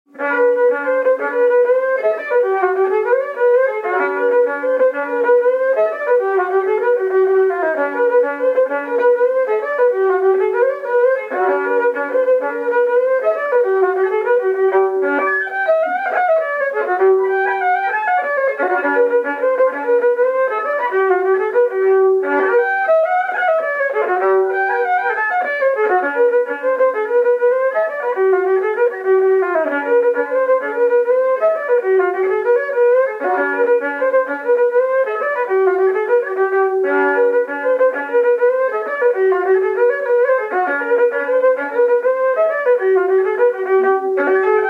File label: jig; The idle road